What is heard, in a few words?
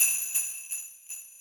BELL